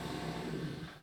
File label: chain saw turn off; devices accessories utilities; NS Studios - ultra sound collection